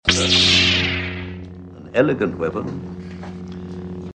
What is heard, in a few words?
star wars light saber; elegant weapon